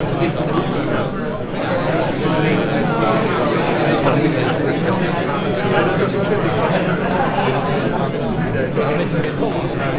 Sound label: babble, noise